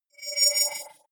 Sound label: wobble swell shimmer; sweeps-swells